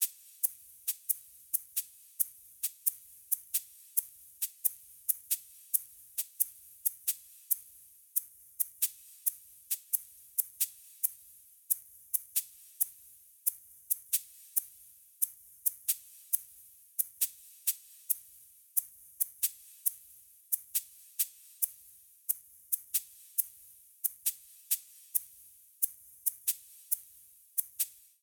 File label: 68 Glimmer Drums Shakers